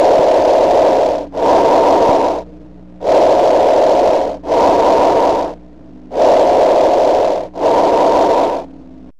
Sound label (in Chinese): 支气管呼吸音